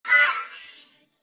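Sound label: ring burst release; sonic x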